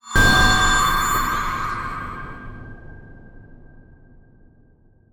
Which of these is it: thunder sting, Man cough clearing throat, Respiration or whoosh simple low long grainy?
thunder sting